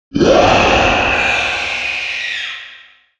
emoticon

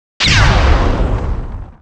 ATTACK JUMP

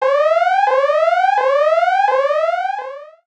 SYSTEM PVP ALARM

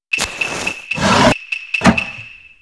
RANDOM DOOR

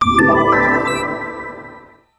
music stone yes

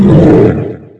BE ATTACK; PROLOGUE; LAST BOSS

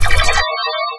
ATTACK

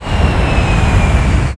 rope move up

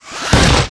attack

